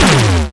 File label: YM Noise; NOISES; ChipShop Deluxe; ChipShop Hits